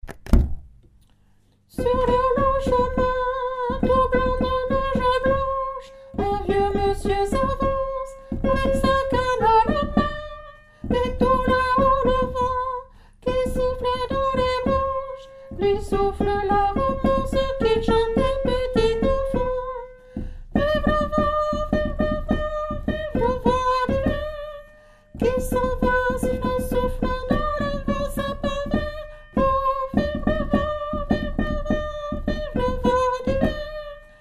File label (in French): vive le vent tenor; nouveaux noels; chants noel